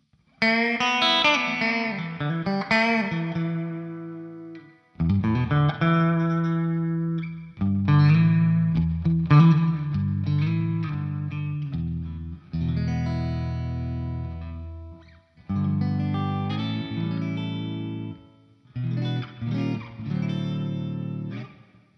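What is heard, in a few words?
clean, guitar pickups; MudCat; telecaster; Neck/Bridge 1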